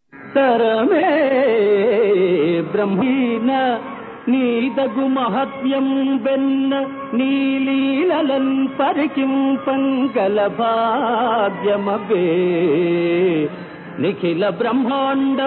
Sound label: Padyam; KrishnaLellalu